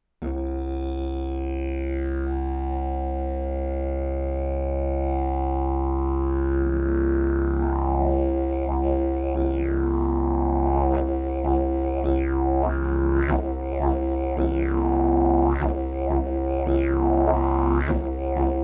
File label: didgeridoo; jilm spiraly